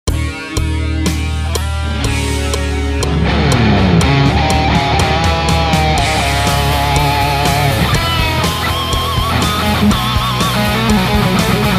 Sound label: guitar solo